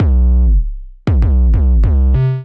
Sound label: Boom Drone B Minor 95bpm; Tunable; MIDI Clips; Bassline; Ableton; Factory Packs; Chop and Swing